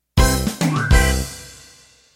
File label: Gospel Rock End